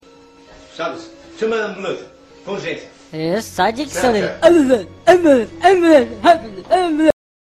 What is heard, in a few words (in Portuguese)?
ambulancia